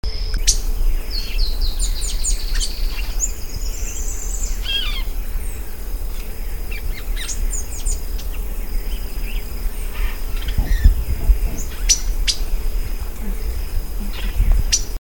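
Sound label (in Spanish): frute overo, Cissopis leveriana, Magpie Tanager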